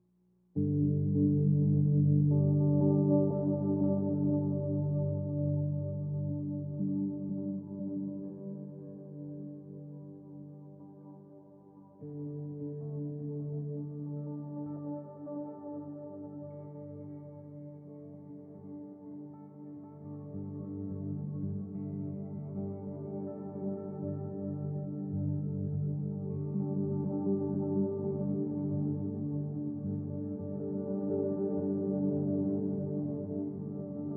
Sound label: Foggy River (Drifting dreamy guitar drone); atmospheric